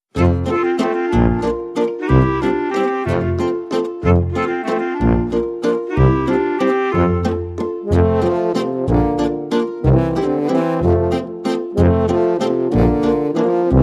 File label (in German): Klarinette